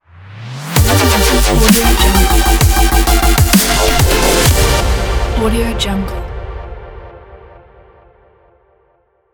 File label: dubstep short logo